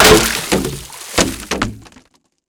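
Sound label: destruction water cooler; Explosions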